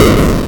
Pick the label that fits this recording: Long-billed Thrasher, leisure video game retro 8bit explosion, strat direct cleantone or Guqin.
leisure video game retro 8bit explosion